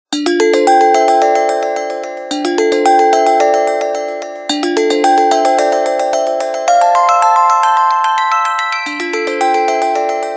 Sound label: Ring Chimer